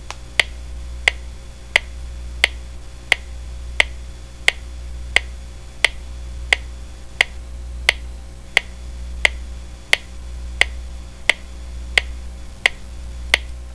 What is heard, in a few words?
beat, whistle